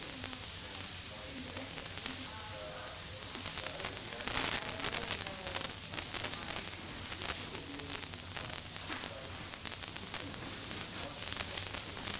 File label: on the floor